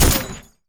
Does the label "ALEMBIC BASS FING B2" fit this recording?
No